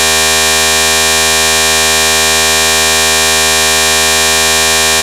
Error Tone Steady

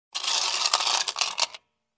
Coins tinkling in coin box

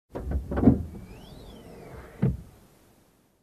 Opening Trunk

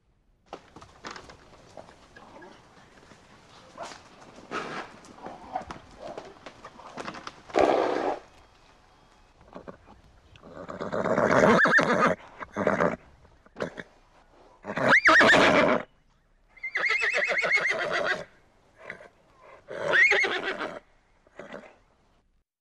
Horse neighing upclose

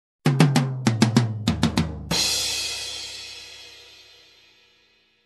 Slow tom and crash drum fill

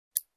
Scissors Snip

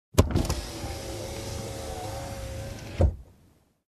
Car window uplift

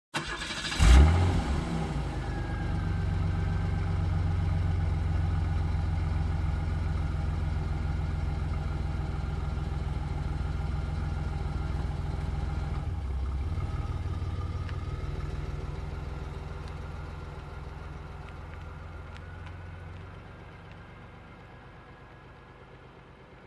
Muscle Car engine start and slowly drive away

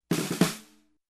Short Snare Roll

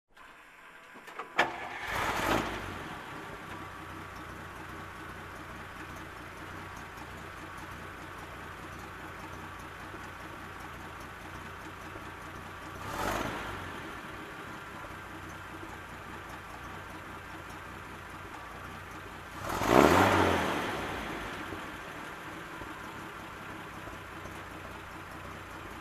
Military truck engine rev up

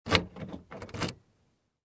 Car Key put in lock and turn

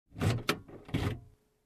Car door unlock

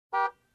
Car Horn short honk

